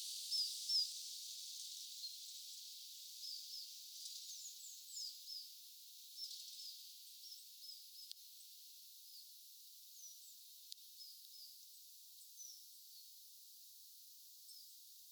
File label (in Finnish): oletettavasti kuusitiainen